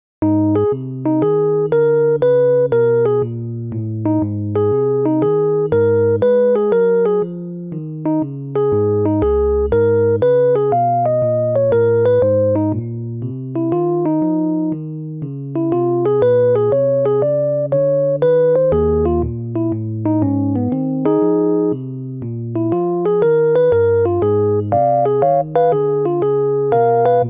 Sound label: Blues; 잔잔